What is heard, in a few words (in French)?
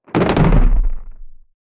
bruit objet qui tombe sec; blunt